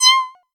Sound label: cat like